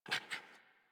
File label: Puppy Idle Pant Short; Enemies; Vocals; Kubrow; Warframe